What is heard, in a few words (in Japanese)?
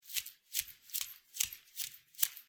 フローリングの上を裸足で歩く音